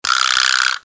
raz boing, beeps boings; KABOOM! - Wild and Wacky Sound Software

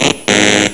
wrong; Now Pop Quiz

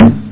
hard bongo; OctaMED SoundStudio V1; drumkit

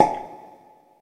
bubble crack; diver jimmy

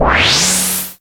Motion, twisted reality

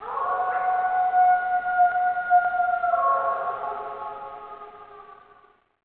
wolf snow; CRC2005; CRC 2005 Demo

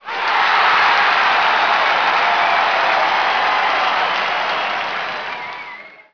clap, stadium; hockey95

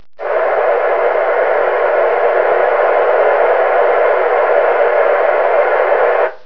static; 8bit

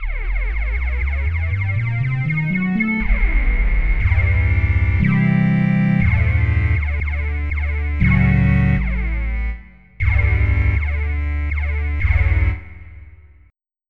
ARP Saw Sweep, synth

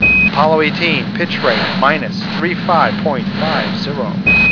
PITCH

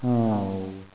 Lose Game; HangMan Plus 3.0

